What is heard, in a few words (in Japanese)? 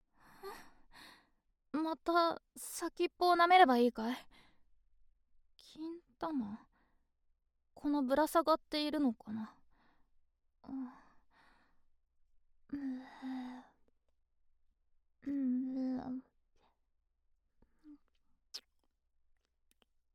陰茎舐め; SE無し